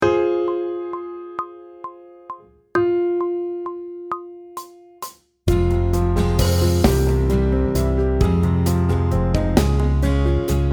Sight Singing backing track, pop vocals; grade 6

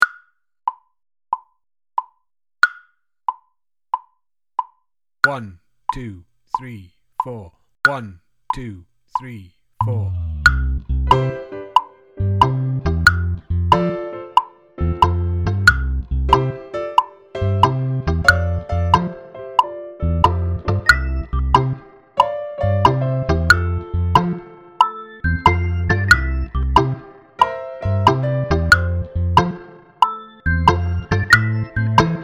Jammy Doughnuts Click, drum kit

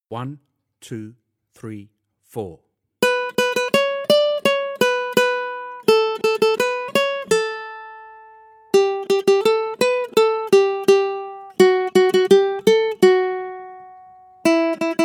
Accompaniment, ukulele; grade3

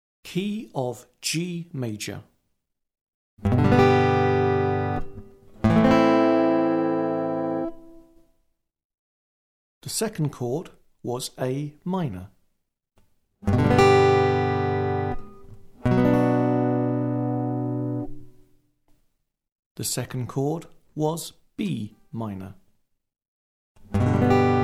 Aural G Major, acoustic guitar; Grade 6

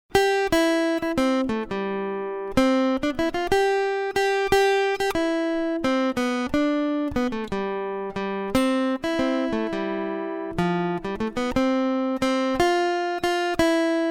Aural Rhythm Test, acoustic guitar; Grade 8

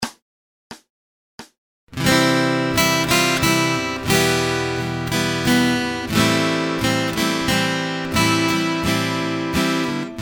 Acoustic Accompaniment, acoustic guitar

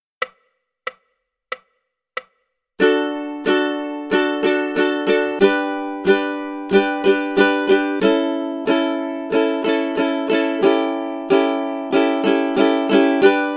rhythm study, ukulele; grade1

